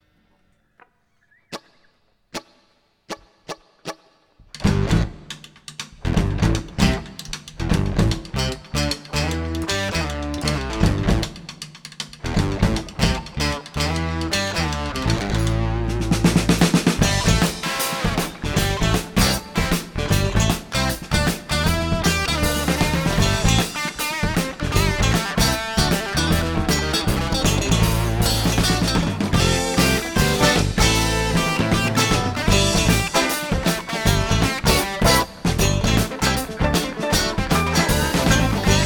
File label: Cicada Jam